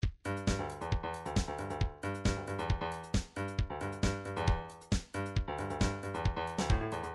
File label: trance midi loops beats sfx click; Newtronic Trance Constuction Kit